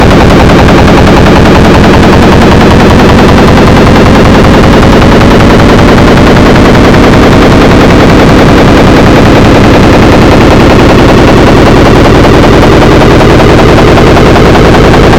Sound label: machine Noise; 6502 Computers; rand8